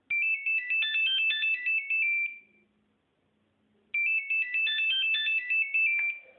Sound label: nokia 1100 etude ringtone